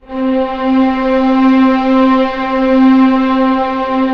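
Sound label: strings C3 PP L; Sound On Sound - Peter Siedleczek's Orchestra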